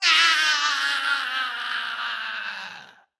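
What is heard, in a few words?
Bronzo Injured death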